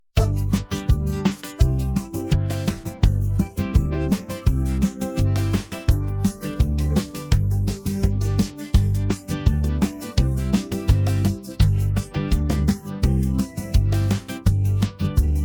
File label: Instrumental, Podkład muzyczny; Zelga; autor:inteligencja Sztuczna; piosenki dla dzieci